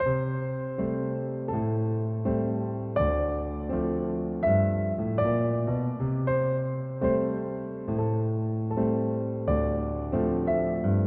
JazzWalk

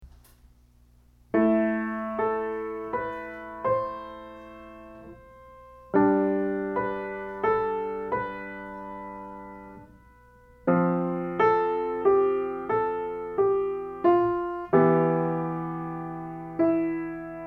A Shepherds Flute, Live Recital

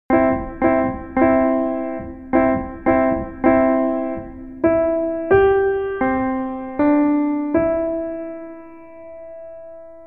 Jingle Bells, student recordings

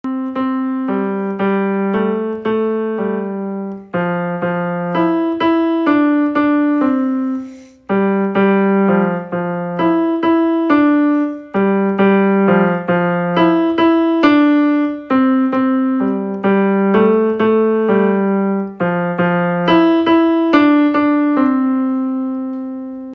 Twinkle Twinkle Home Recorded

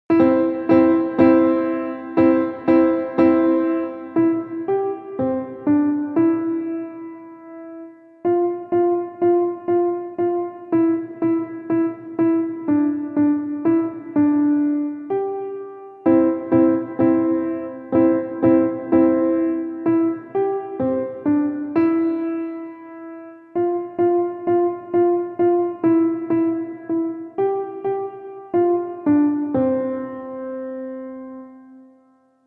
JingleBells

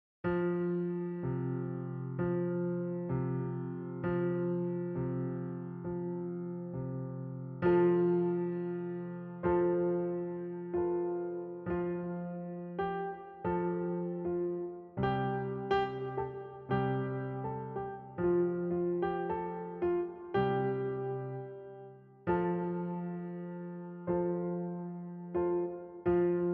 student recordings

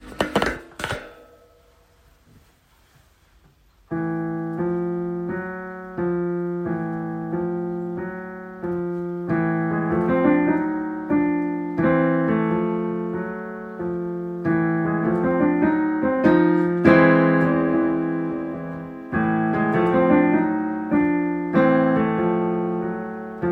Clarinet Blues Home Recorded

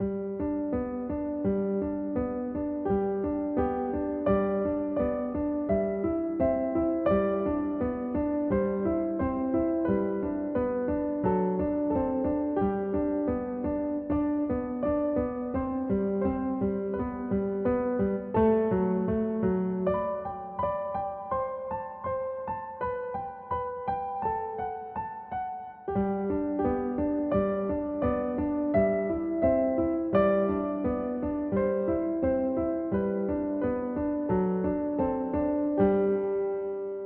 Twinkle Twinkle Little Star Duet

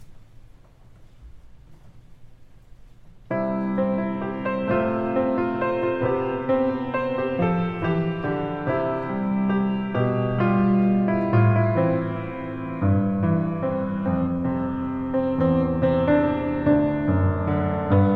PianoMan; Recital

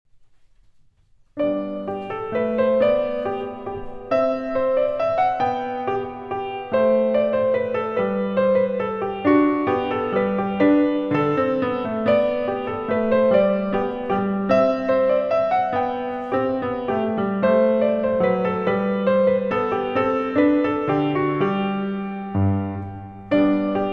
Bach Minuet In G, Recital

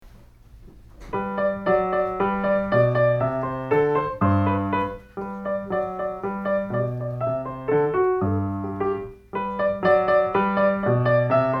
Russian Folk Song, recital

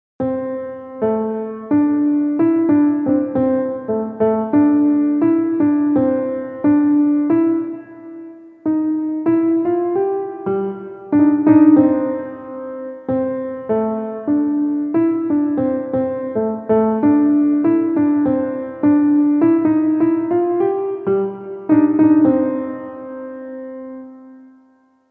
JazzWalk

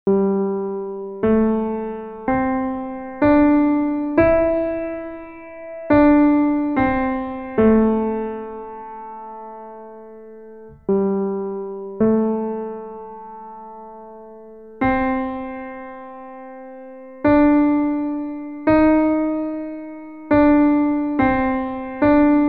Penguin Blues solo

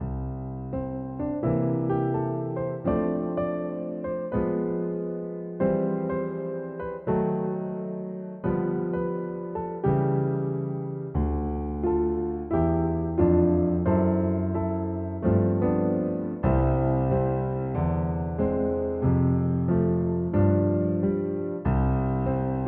Piano; Gershwin

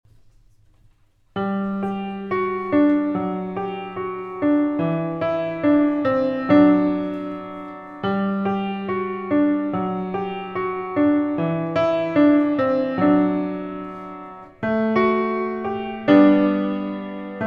Recital

